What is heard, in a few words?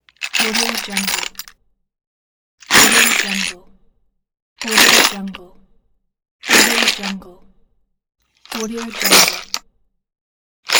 box of screws